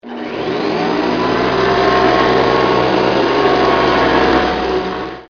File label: vacuum